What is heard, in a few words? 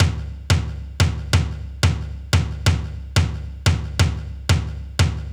Kick Loop, Drum & Bass